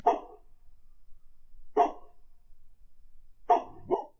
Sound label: dog barking; Brandon Morris